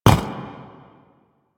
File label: Footstep; Warframe; Warframes; Qorvex